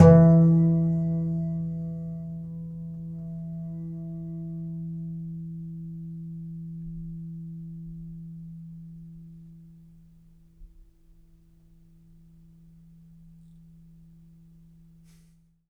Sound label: C. Bass Balalaika, Plucked Strings